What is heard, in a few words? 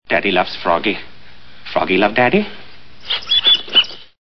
froggy